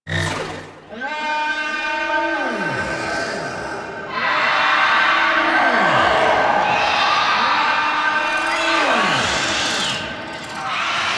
Infested Defense Start Waves; Missions; Orokin

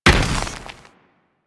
Moa Combined Step Dirt, footsteps; Enemies; Warframe

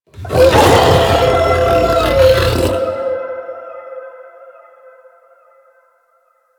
Alerted Howl A; Enemies; Vocals; Warframe; The New War; Archon; Amar

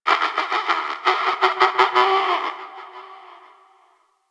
Forest Distant Medium Creature Small Call; Warframe; Grineer Forest

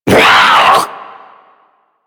Infested Ancient Pain; Enemies; Warframe; Puss Ancient

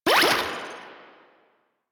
Pick Up Energy; Warframe; Caliber Chicks minigame; pick ups

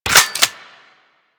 Reload, Weapons; Strun

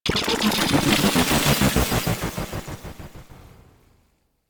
Hub Cephalon Wall Dissolve; Warframe; Ambience; Tenno; Syndicate Cephalon Suda

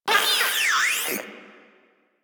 Maggot Bark; Enemies; Vocals; Warframe; Proto Infested

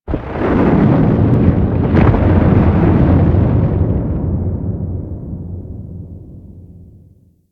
Moon Earth Crack Large, Ambience; Warframe; Orokin

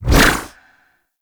Melee Long Whoosh; Warframe; Weapons

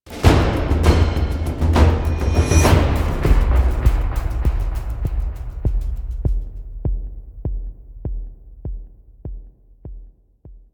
PVP Planet Match Start, Music; Warframe; DE

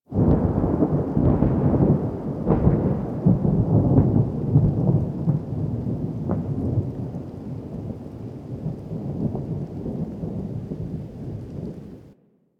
Landscape Thunder Crack Low, Ambience; Warframe; Venus Open World; One Offs

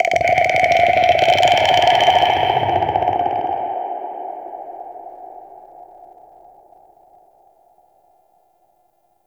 Infested Golem Vocal Idle; Warframe

